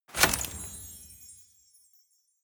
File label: medieval collect loot medium, swooshes swishes